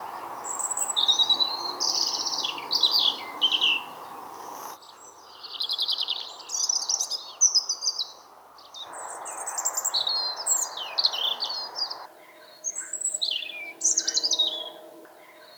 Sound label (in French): Rougegorge